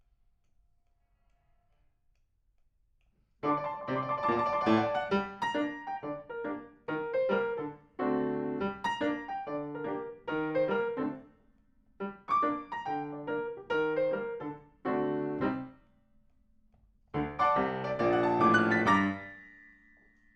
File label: PIANO